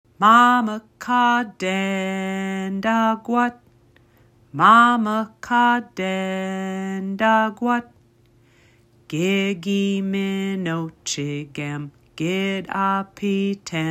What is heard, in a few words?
Graduation Song Slow, Ojibwe